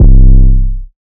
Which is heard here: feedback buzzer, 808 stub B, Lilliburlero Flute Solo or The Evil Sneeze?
808 stub B